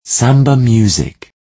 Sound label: samba music